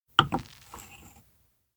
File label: cocktail glass place; anemoi